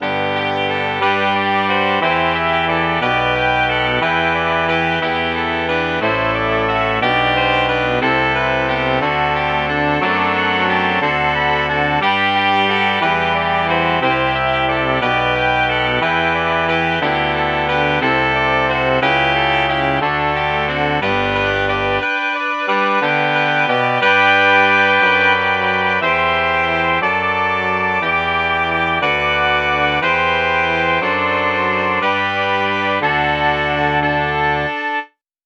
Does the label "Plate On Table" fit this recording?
No